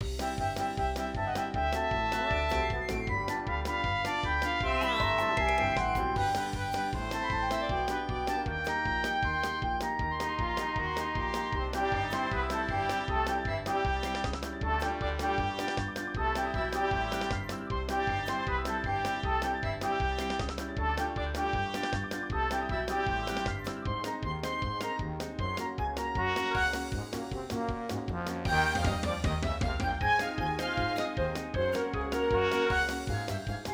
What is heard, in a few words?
Entr'acte; Pippin